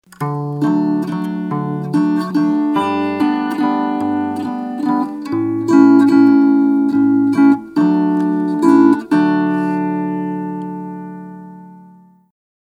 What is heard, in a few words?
Zither